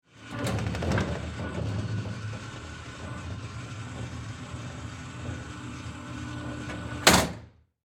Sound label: Jail Door Close Automatic; Emergency; Prison Doors; SFX Bible